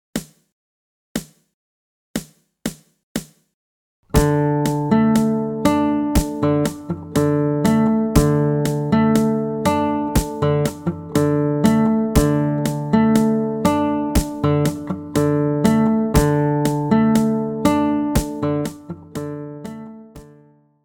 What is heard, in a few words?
ghost notes rechts langsam